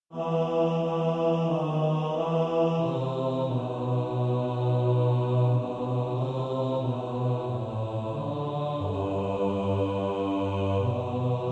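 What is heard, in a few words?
bass, practice music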